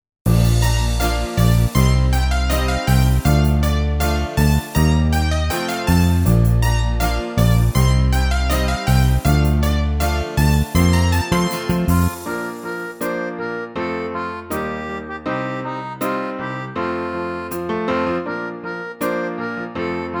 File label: Karaoke